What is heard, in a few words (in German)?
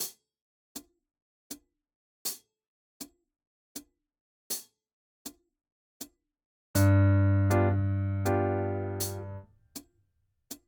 4 Toene Begl.